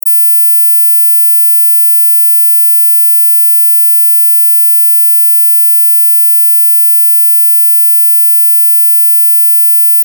mosquito 17400Hz

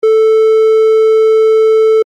pitch no diff Saw

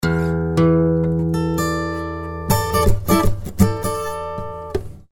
polarity guitar

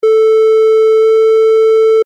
pitch up 10c Saw

